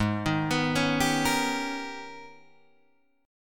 G sharp Minor Major 11th, Guitar Drop D

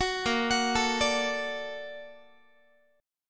A sharp Augmented Major 7th A sharp Guitar; A#+M7; arpeggiated; 21,18,21,19,18,x

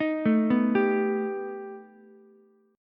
B Augmented 7th B down Ukulele; arpeggiated; JGuitar

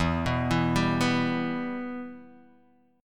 E-Minor, Guitar, DADGAD; 2,2,2,0,2,x

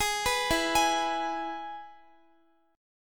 E Major guitar chord; arpeggiated; x,x,18,16,16,15

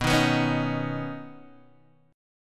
B Major Add 9th B, Guitar, Open G

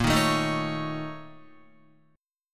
Bb Minor Major 7th Double Flat 5th, Guitar; 6,6,7,8,9,8; strummed; 4E,4A,5D,5G,5C,6F; chord shape

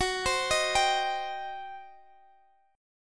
G Major 7th Suspended 4th Sharp 5th, Gb

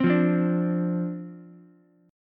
D-Minor 7th, D, Ukulele; Dm7; strummed

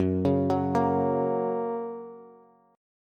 F sharp Major, Bass; arpeggiated; JGuitar

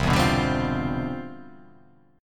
C Major 11th C down; strummed; CM11

